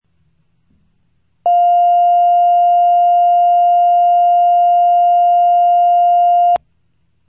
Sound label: steady